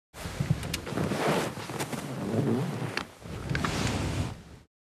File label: Helicopter foley interior perspective boarding the helicopter